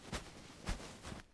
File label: Move Sack; Civ4 warlords